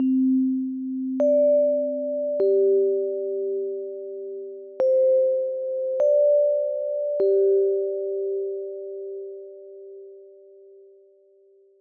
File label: Peaceful Bells